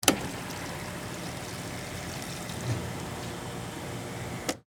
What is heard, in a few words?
Fountain Drink, Soda, Machine, Dispense, Short; Soda Guns; BlastWave